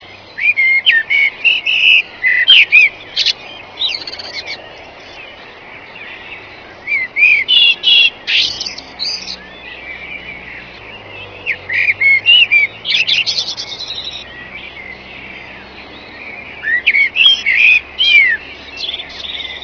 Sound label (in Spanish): mirlo